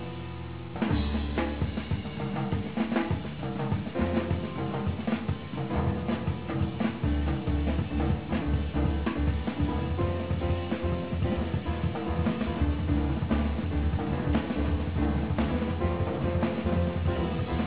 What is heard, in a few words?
jazz